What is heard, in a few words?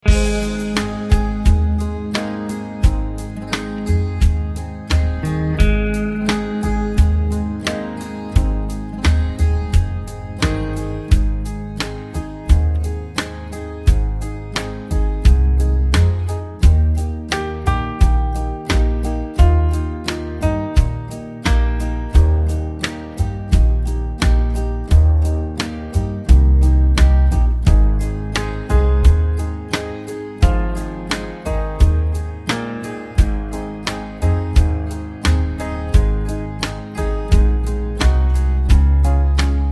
(Ab To Bb) No Backing Vocals